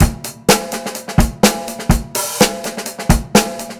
beat, Roots Funk; USB Soundscan vol.41; AKAI

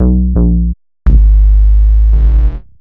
Bass, Futurist Drum & Bass

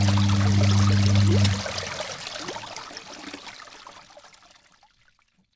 LAUNDRY; AKAI S6000 CD-ROM - Volume 6; Daily Life

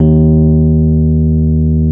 E PNO D#1; Synclavier Sampler Library

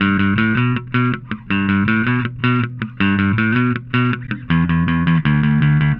Happy Pub Bass Riff; Sonic Foundry (Sony Creative Software); Indie Rock Anthems; Session 05 - Happy Pub

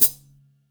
kit, Yamaha Motif-ES8

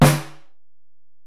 Ac Perc, Drum Machines Alesis DM-PRO

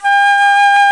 flute, C flute; Roland LCDP04; 90 s Sample CDs; C Flutes 3-8; C Flt Sh 6&7

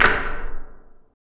RSS PERC

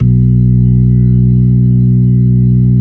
B-3 Club Xfade, Keyboards of The 60's and 70's

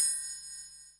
PERC, Dance Mega Drums; Best Service; PERC MIX

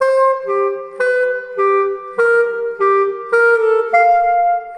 Clarinet Effect; Sonic Foundry (Sony Creative Software); Jazz Solos and Sections; Effected

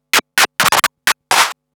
Scratch Fills 140BPM

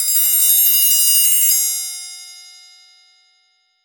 Metal, Percussive FX; musicradar; cartoon caper

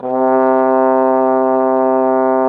trombone; Sound & Vision - Gigapack I CD 2 (Roland); Brass&Woods2

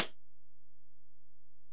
HiHat, Alesis DM-PRO

